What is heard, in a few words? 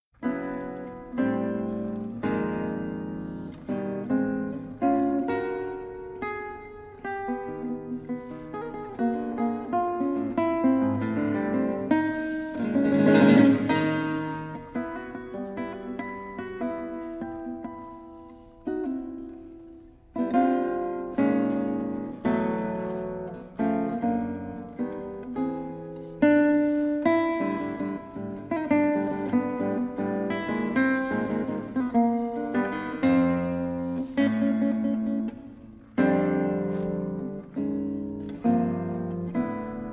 guitar